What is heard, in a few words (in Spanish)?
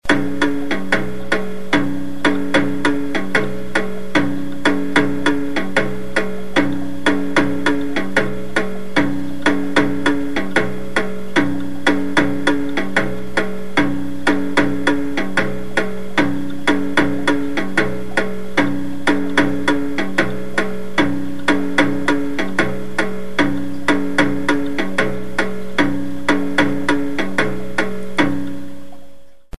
ritmo regular